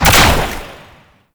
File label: Ranged Weapon Fire; Warframe; Necramech